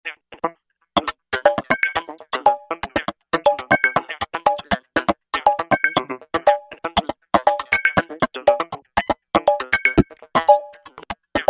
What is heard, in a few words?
Hi Tech & Nz, Roland V-Synth; Factory Patches